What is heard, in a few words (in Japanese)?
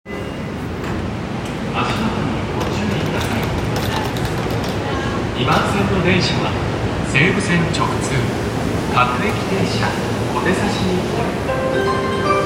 有楽町線市ヶ谷西, train sounds